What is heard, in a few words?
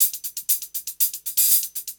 120 HIHAT